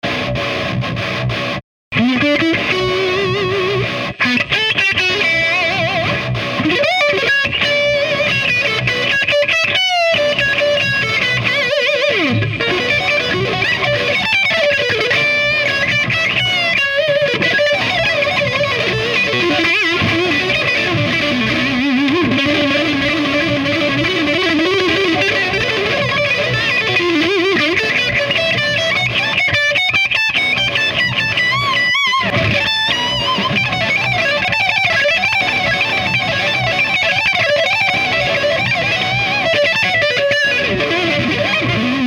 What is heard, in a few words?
Fryette Pittbull UL Rhythm Channel Shred